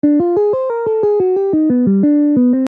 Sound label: minor 2 5 1